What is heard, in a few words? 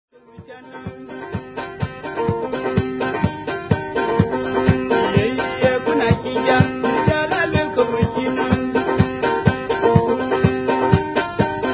Sudanese banjo